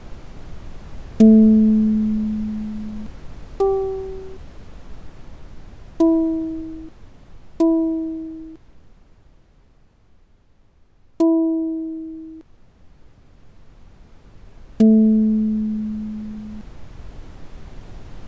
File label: rain